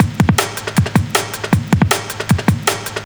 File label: drums, Hardcore Breakbeat, 157 Dmin; Big Fish Audio - Hardcore Breakbeat Action; Disconnected